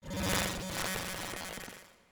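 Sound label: signal interference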